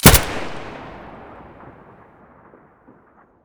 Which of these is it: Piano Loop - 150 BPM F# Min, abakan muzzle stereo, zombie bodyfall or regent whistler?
abakan muzzle stereo